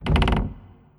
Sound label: Wood Creaks